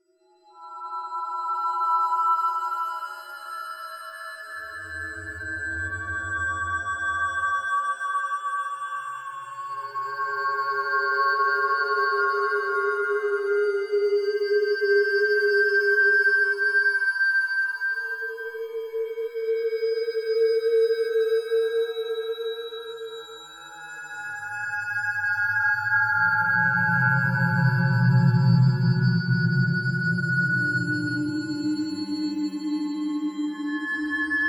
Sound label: spectral drone maker, synth; analogsynth